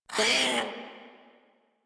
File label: Kavat Vocal Pain; Warframe; Khora